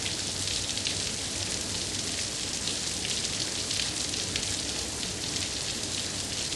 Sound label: Blood Stream; Game rip; MiSide